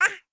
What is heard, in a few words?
human baby 3 months happy vocal; sound effects one